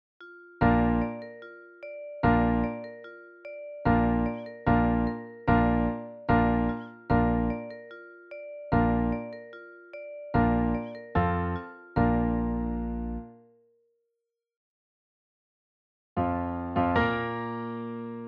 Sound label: Bugle Calls